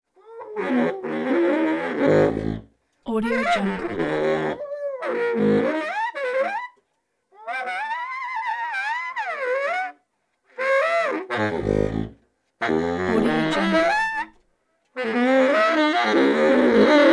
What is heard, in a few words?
bass saxophone conversational